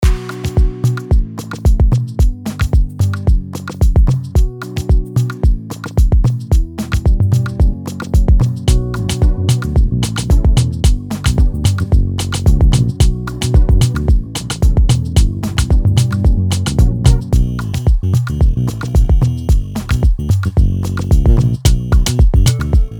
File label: Type Beat; Produced By Deobeats